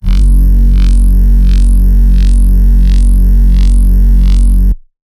Bassline; Ghosthack

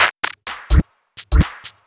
Electro Loop 128BPM; Vengeance Samples

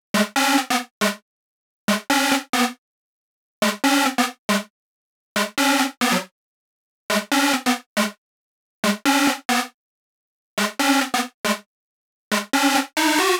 Mainlead DRY 138BPM, G#minor; Melody & Synth; Vengeance Samples; Manhattan Kit

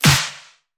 Pre-Shifted Claps; Vengeance Samples

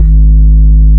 Root G#, Bass; Vengeance Samples

